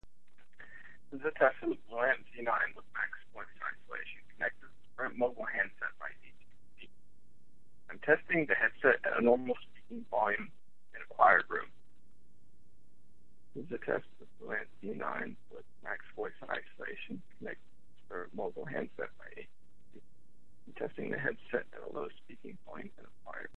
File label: Quiet room; BlueAntZ9 max; Mogul